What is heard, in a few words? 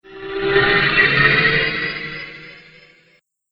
Short Sf Rising Transition, sci fi